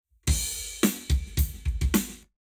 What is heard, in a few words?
Drums, 0deg; Lab Wet